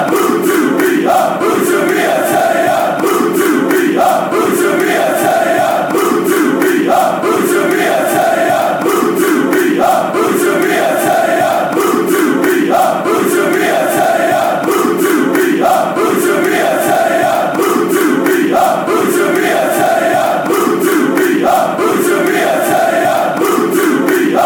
oh to be a terrier, chants

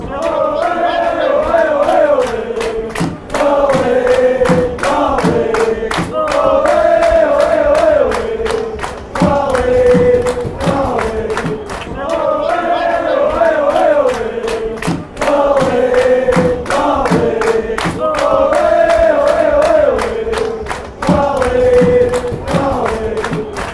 ole ole ole, fanchants; caley

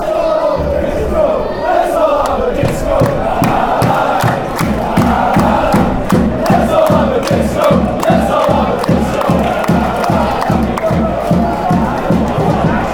lets all have disco, fanchants; york

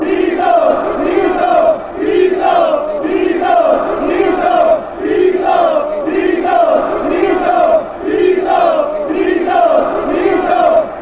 chants

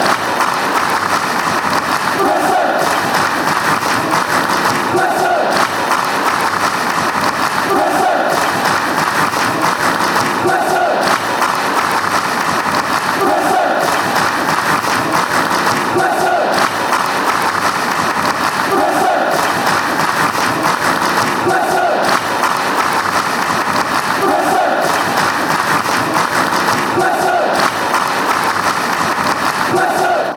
preston fanchants

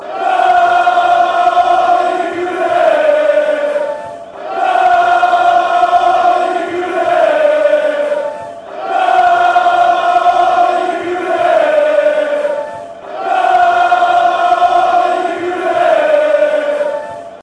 come you reds, fanchants; drfc

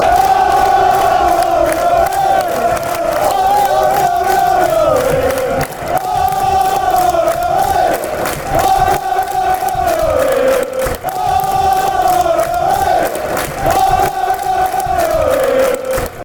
whoa, fanchants

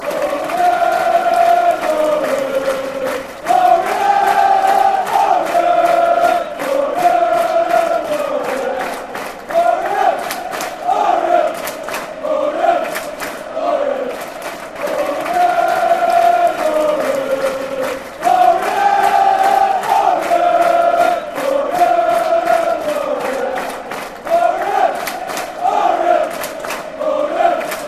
orient orient, chants

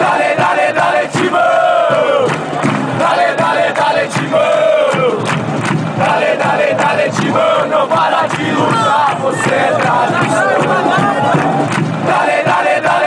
dale dale dale timao, chants

